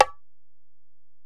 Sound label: Ac Perc, Drum Machines Alesis DM-PRO